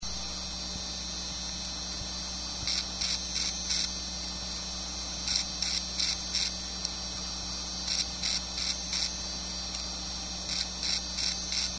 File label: gagagaga sound